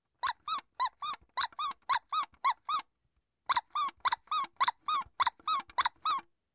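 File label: toy squeaks, squeak of a toy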